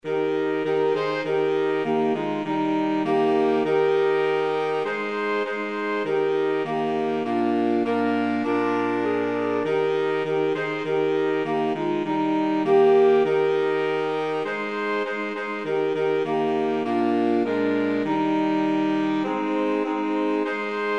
saxophone quartet; up on the house

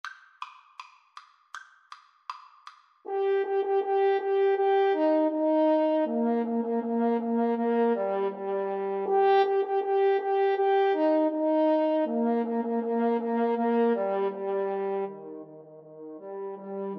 duos marcia, French Horn Duet

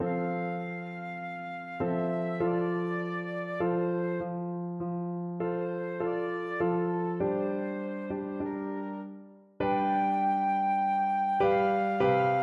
flute

